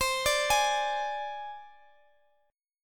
C Suspended 2nd Sharp 5th, down, Guitar, Open G

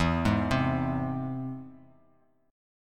E Augmented E; E+ arpeggiated; JGuitar

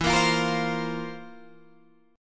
C 11th E

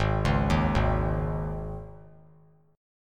G sharp Suspended 4th G sharp; G#sus4 arpeggiated; JGuitar

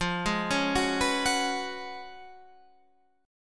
Gb Major 7th Suspended 2nd Suspended 4th

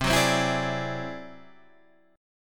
D 9th Flat 5th C, guitar

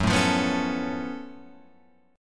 Gb Minor Major 11th Gb down Guitar Open F; GbmM11 strummed; JGuitar

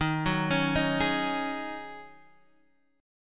D sharp Minor 11th; x,x,x,8,6,8,5,5; chordshape

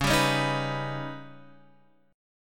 D sharp Augmented 7th C sharp, guitar

